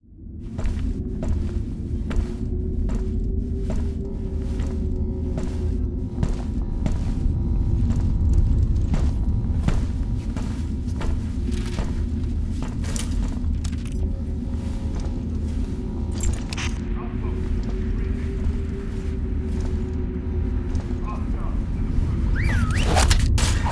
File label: cutscene sfx